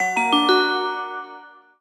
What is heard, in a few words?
Gimmick